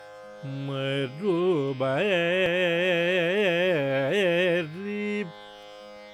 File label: Abhogi, Shuti, G